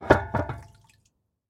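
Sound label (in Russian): пошатнувшаяся на столе металлическая банка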